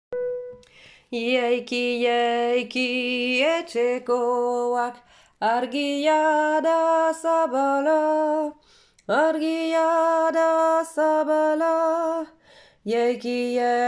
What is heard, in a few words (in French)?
chant sopranos